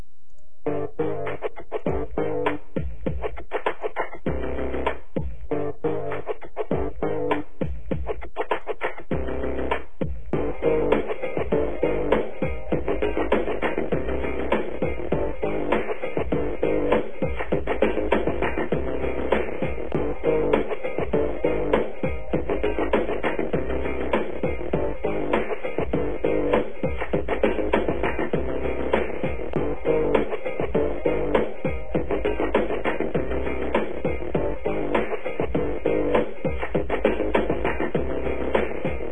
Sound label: beat